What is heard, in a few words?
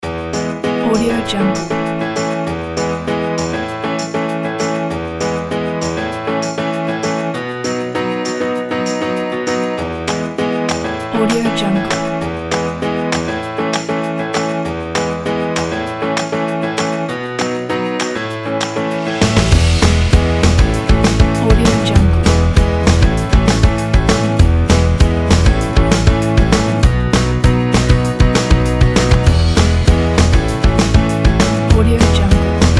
bright pop tune